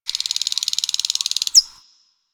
Forest Bird Rattle; Warframe; Ambience; Grineer Forest

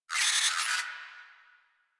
Space Drone Death; Warframe; Corpus Space Drone

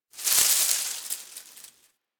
Derelict Tree Shake; Warframe; Orokin Derelict

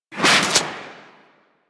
Crossbow Shot, weapons; Garuda

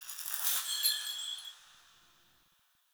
Mote Chatter; UI; Motes

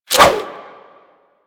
Sword And Whip Whoosh; Warframe

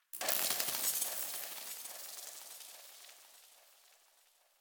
Ship Metal Movement Texture Small, Ambience; Warframe; Corpus Ship Remaster

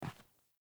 Grunt Boot Walk Default, Footsteps; Characters; Enemy; Melee Grunt; Boots; Sinecure

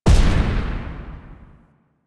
Scythe Projectle Die; DE; Warframe; Weapons; Archwing; Melee; Exalted Archwing Scythe

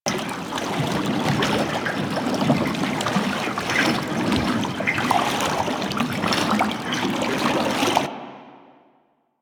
Alchemy Transmuter Idle Loop; Warframe; Entrati

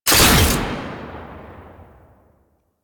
Fulmin Prime Shot, Weapons; Warframe

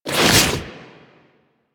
Inf Transform Claws Whoosh, Infested; Warframe; Weapons

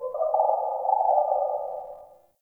Cave Creature; Ambience; Caves; Glades Of Andurin

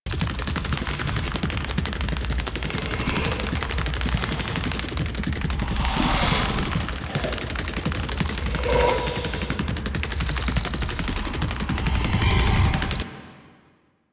Infested Big Ashen Move Loop; Warframe

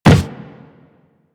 Run Wood, Footsteps; Warframe; Operator

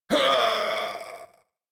Death, en; Warframe; New War; Teshin

